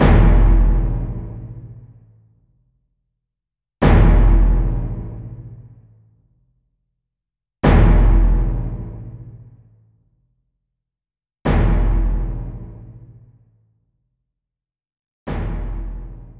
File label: BGM; Tokyo 24-ku Inoru